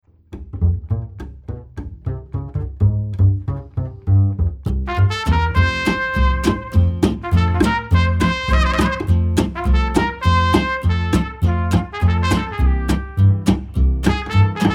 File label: jazz